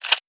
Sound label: Percussion; Cymatics; Eternity Sample Pack; Drum One Shots